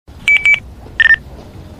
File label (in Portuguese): Som de Radio